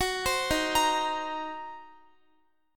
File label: D sharp Minor 6th, Guitar; D#m6; arpeggiated; x,x,16,17,15,17; 4E,4A,5D,5G,5C,6F; chord shape